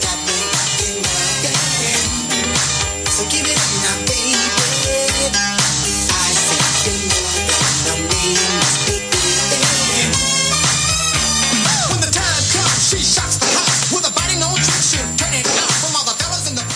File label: Pirate Music 6882 kHz; Pirate Music, Italy; incredibly clean signal